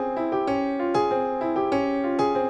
Latin Lounge Piano

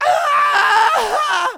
Screams, group Of People

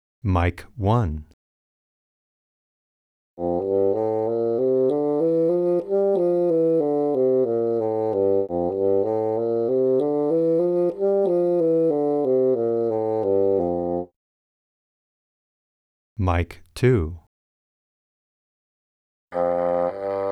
Bassoon

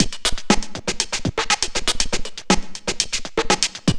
Club Dance Beat

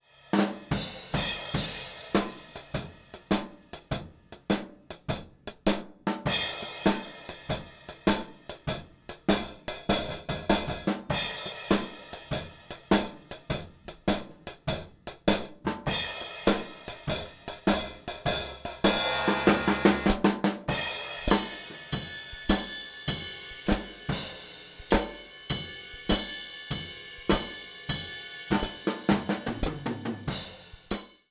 HiHat

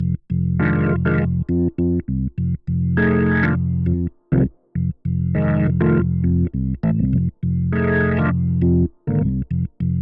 Blues Organ Hammond Growl F 101

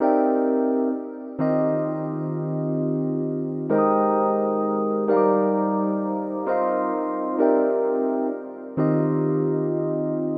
Eb rhodes loop; Electric Piano; Electric Piano Rhoads; Various Keys

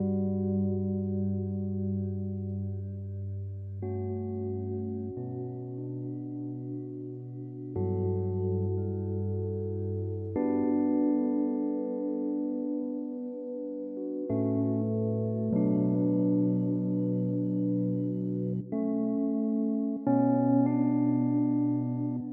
G soft rhodes keys; Electric Piano; Electric Piano Rhoads; Various Keys

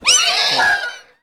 Horse Whinny; animals

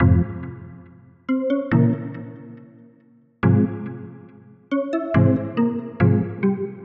Pensive Tension stabs Fm, Cinematic Trailer Loops

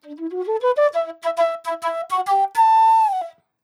Blues ethnic Flute D 132

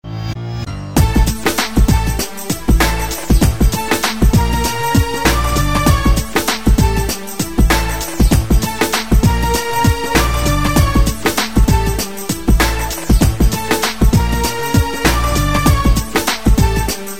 Music background pads

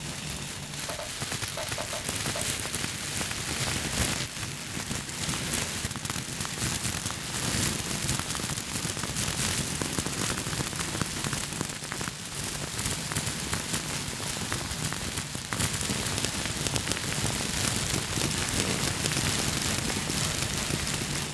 Texture Grains, Granular Synth Loops; AllCrunch; Hydrosphere

